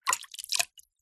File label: nature water tiny splash movement